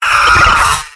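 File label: pain severe, male; tf2; garrysmod; mvm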